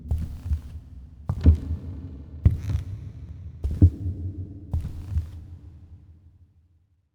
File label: Footsteps Wood Reverb; Inquisitor 3